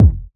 warm sounding bass drum sample D# key, Royality free kick drum tuned to the D# note